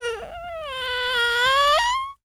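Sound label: TALK, baby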